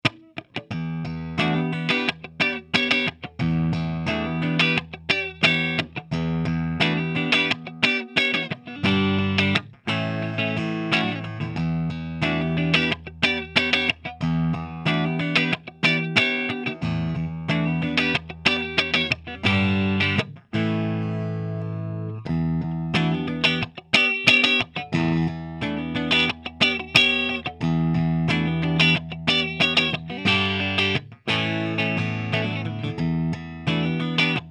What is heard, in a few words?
Almost Clean Regular Guitar